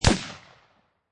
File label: Machine Gun Ak 47 Single Shot; Machine Guns; Sound Effects:Guns; Lynne Music